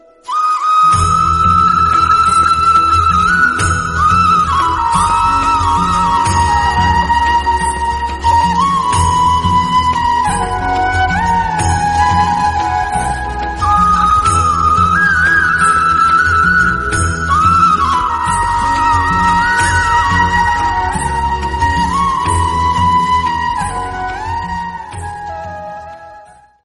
flute music; radha krishna

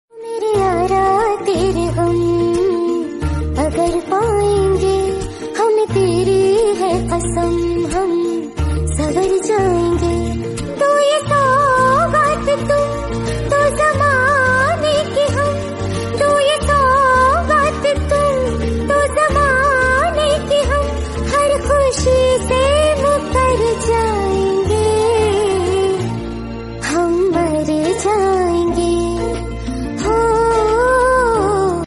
Hindi Song

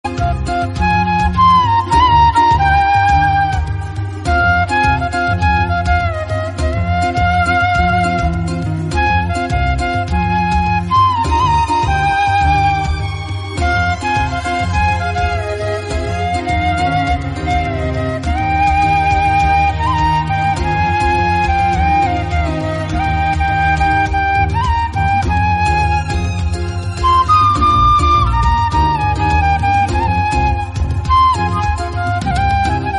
remix, flute, instrumental; mohra

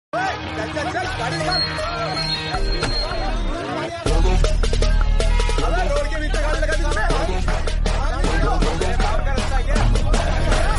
attitude bgm remix

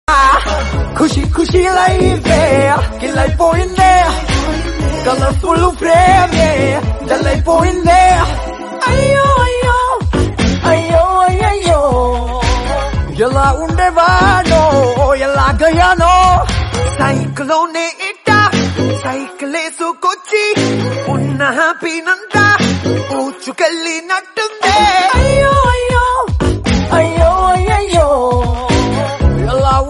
telugu song

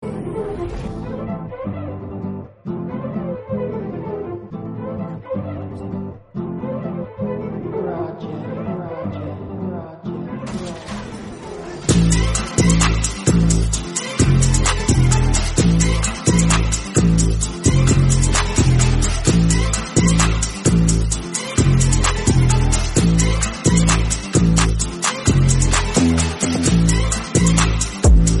bass boosted instrumental

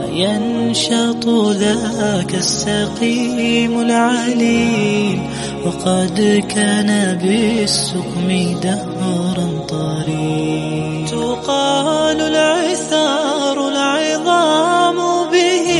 allah hu allah, islamic, naat sarif, arabic

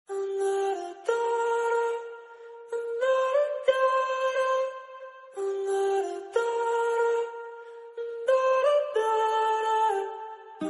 mood music

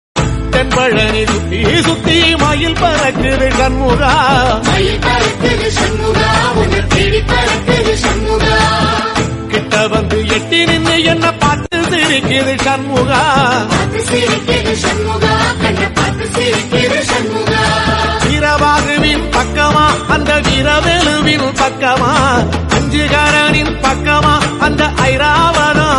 bhakti; kashi vishvanath

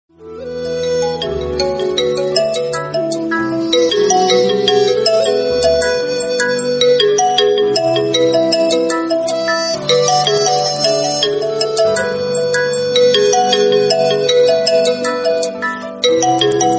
vivo v19 pro mobile tone

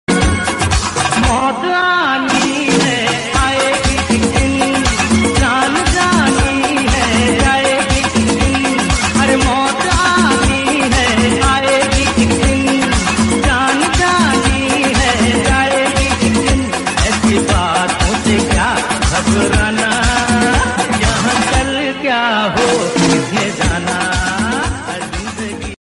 old hindi

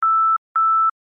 double beep

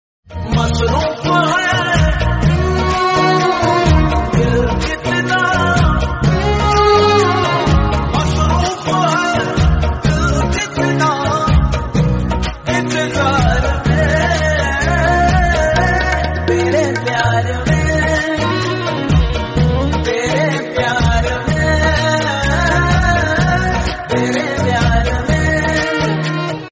romantic hindi

